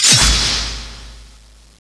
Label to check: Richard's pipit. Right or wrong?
Wrong